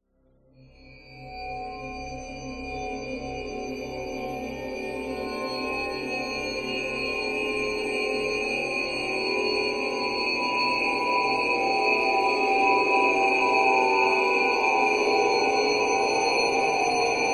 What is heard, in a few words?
horror swell shrill slow sinister evil haunted tense thrilling